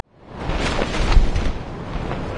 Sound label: recall wind; LoL; janna forecast